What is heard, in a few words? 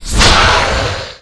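Normal Attack; Cracker Humar; Gamigo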